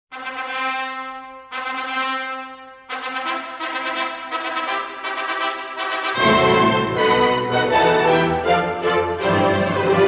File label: recess horns